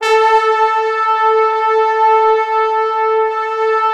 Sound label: A3, Orchestral Brass; Best Service ProSamples vol.20; AKAI